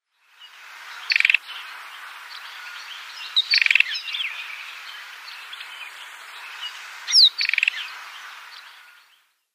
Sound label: White-throated Robin calls